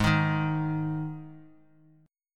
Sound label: G sharp 5th G sharp, Guitar; strummed; JGuitar